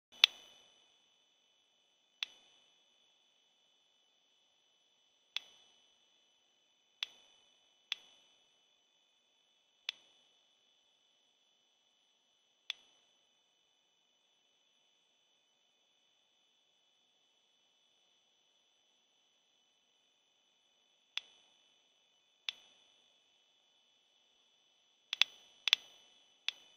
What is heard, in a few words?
clicks, geiger; geiger experiments